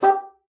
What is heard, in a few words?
bassoon, G4; Xsample VOL-6